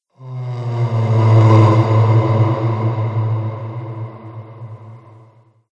Ghost Spooky Moan; Ghost Sound Effects; horror

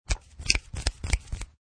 A series of finger snappings; Human

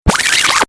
Turntable Scratching Reverse; DJ; Musical

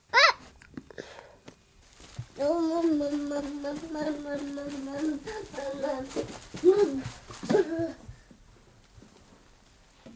12 month old baby boy, running around saying Mama; Baby Sound Effects; BBC

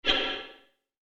Sharp Cave Echo Whoosh; swish, sweep and swoop

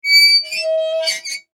Impact Metal Squeak; Metal Sound Effects; Metal (Sound Effects:Materials); SFX Bible 6

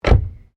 CAR SUBARU LIBERTY EXTERIOR DOOR CLOSE

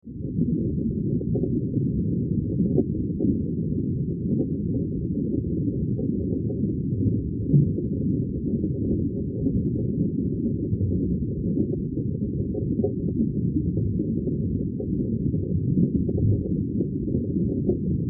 Water Underwater Ambience; Water (Sound Effects:Nature)

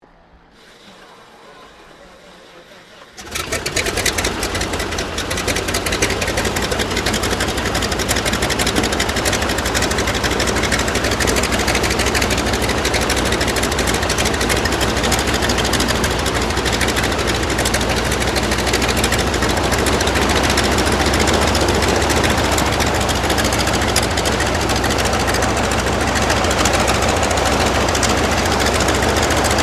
AIRCRAFT CAC WIRRAWAY STARTUP STEREO; CA-16 Wirraway; Airplane; Vehicles

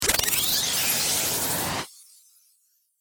Power Up Stinger, Scifi, Ascend; Science, Fiction; Spacecraft Sound Effects; BlastWave

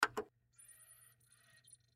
Cd Player Press Play; Technology; CD Players (Sound Effects:Electronics)

